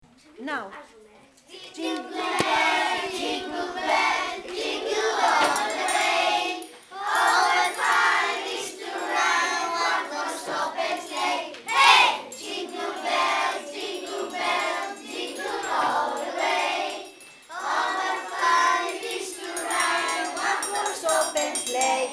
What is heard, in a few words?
JINGLE BELLS